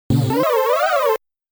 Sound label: Credit Sound; Gaplus